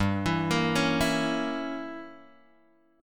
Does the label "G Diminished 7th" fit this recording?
Yes